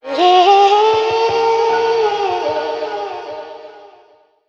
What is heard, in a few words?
"yeah" female SUNG; ooh ahh